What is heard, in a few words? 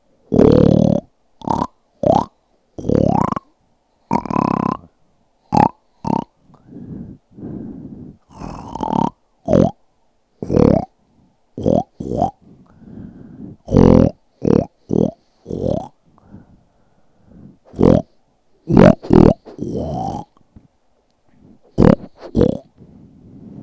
oinks